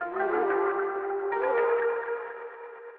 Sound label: ethno, 160, C